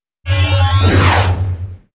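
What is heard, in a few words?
Skill Thunder strike; Fly For Insanity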